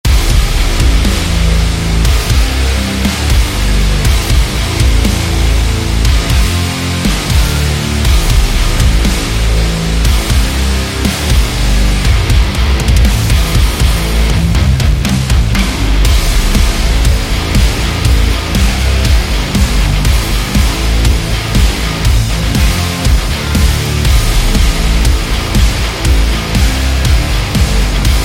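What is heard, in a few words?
Rage, metal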